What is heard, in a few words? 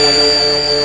Screen Noise Up; Mo' Phatt; Digital Sound Factory Mo' Phatt